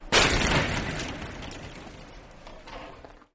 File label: Hockey Player Skate